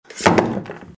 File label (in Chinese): 書掉到地上